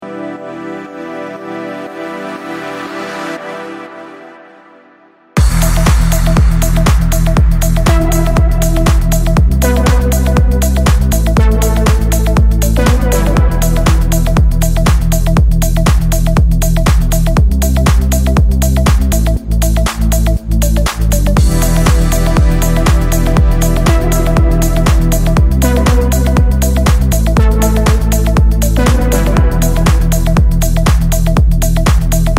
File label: DEEP HOUSE SERUM